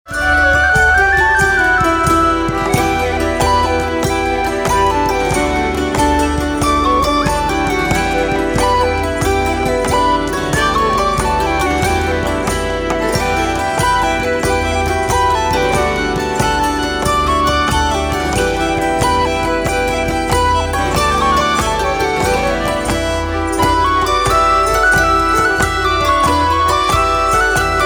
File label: Swallowtail Jig